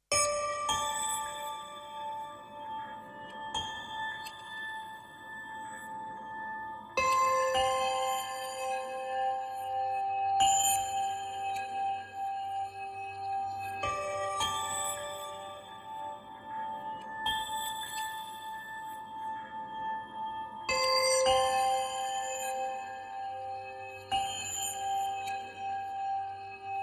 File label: Bells